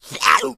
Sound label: smoker reel tongue in, miss